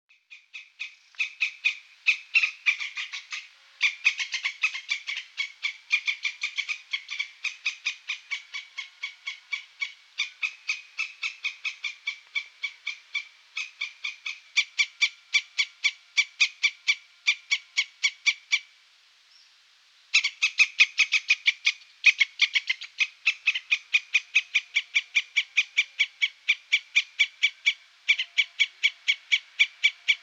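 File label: voice squirrel; belka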